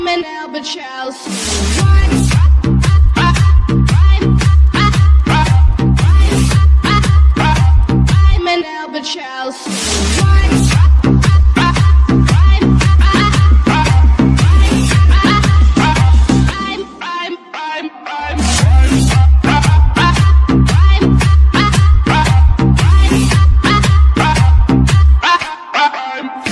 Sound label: vivo Mobile Ringtone